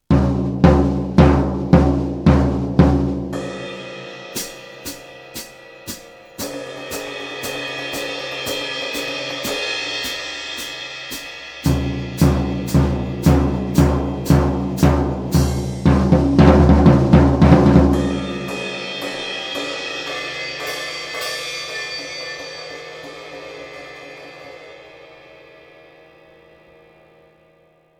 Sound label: marching band